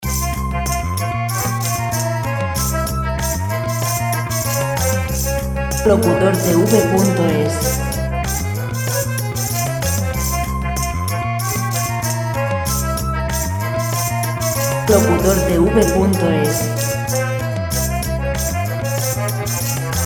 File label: 95 folk; folk musica libre